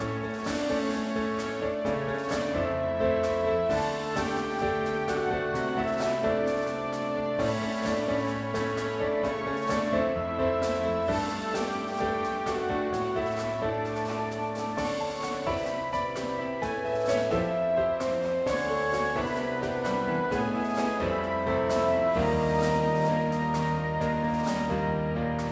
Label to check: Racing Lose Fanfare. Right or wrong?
Right